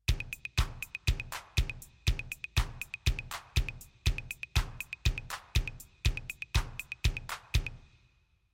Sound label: midi sync; yocto